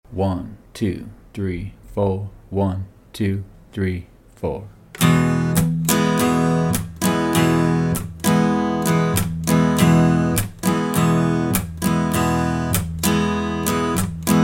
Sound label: strum